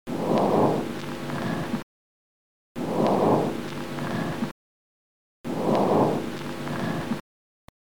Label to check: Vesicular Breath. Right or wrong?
Right